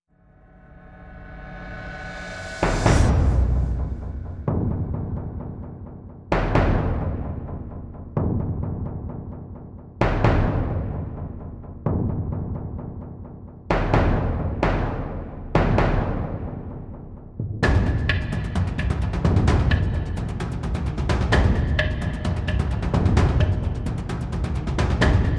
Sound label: drums